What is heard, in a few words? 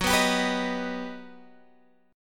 G Augmented Major 7th